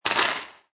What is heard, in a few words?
Sports Hockey Puck Hit Ice Rink; Sound Effects:Sports